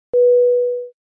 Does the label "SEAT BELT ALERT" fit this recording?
Yes